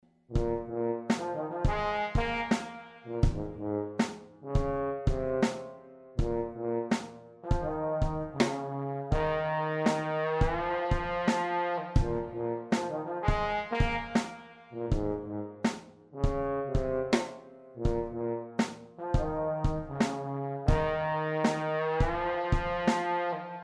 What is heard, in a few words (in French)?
trombone tuba